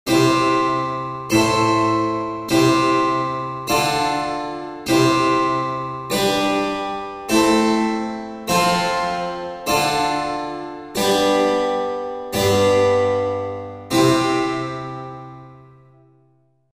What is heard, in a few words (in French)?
Exo accords de 5tes